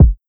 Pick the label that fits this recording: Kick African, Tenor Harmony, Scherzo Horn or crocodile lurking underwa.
Kick African